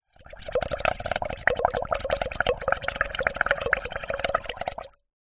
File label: food drink bubbles blow through straw into metal cup; zapsplat